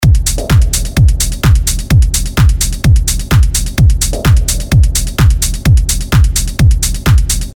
Full Loop

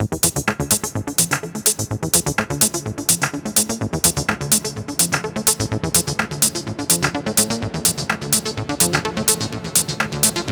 Infinite Arps

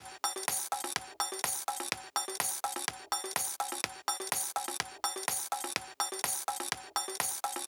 House

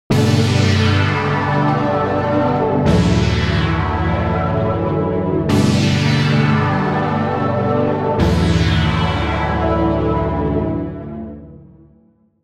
Massive Analogish

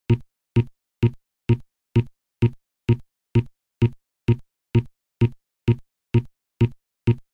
Bass Seq No FX; Dexter

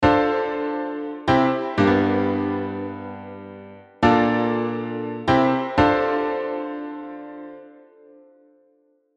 1st Position Chords

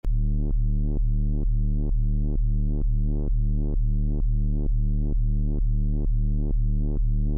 minitaur techno

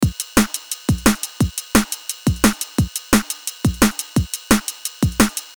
Beat

